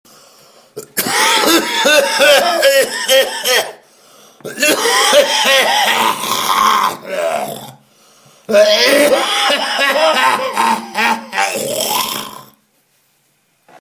Cough

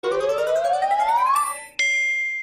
tone

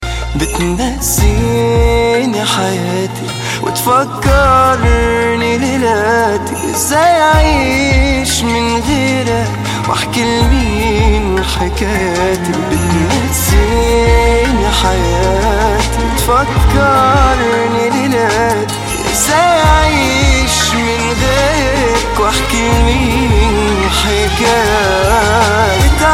Romance

